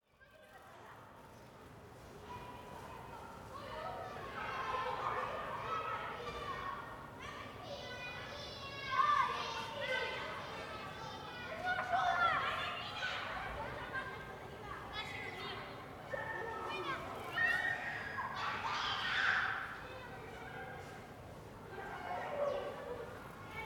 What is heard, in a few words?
Kids Playing